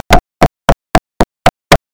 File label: knock